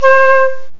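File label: flute; Sounds Terrific II; woodwind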